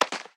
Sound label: hit human floor ricochet; Blind Drive